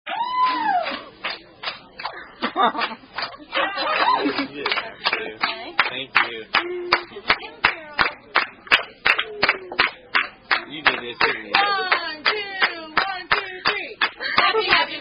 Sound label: Happy Happy Birthday